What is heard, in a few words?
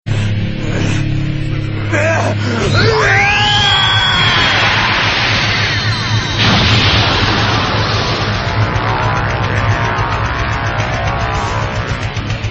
transformation; dragon ball z broly